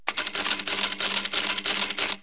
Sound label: adding, money; KABOOM! - Wild and Wacky Sound Software